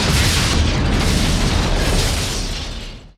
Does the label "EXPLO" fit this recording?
Yes